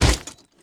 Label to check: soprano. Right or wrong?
Wrong